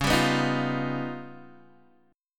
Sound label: C sharp 9th Flat 5th C sharp down Guitar DADGAD, chord